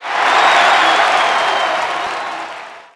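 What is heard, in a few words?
Crowd Roar; PC Gamer IT CD 48; trickstyle; TSdemo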